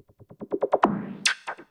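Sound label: PERCUSSION ELECTRO 140, Techno Drum; Techno2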